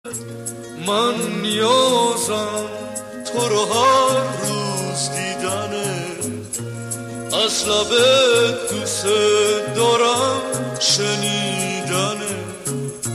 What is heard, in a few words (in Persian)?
کوروس Ringtone